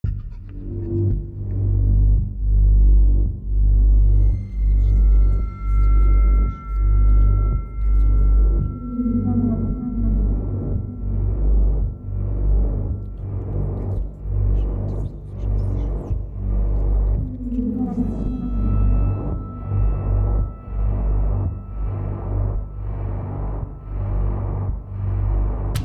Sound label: Horror remix